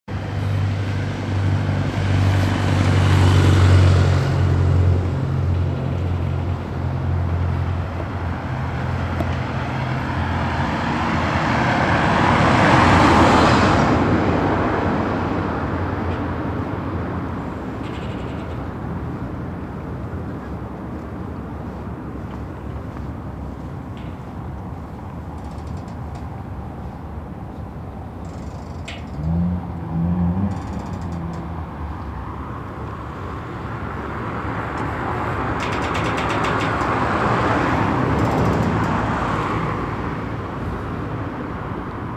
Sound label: fieldrecording docked boat entry stair clicking noise; weeklybeats-2020